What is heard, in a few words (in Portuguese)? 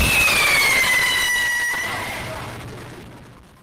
fogo de artificio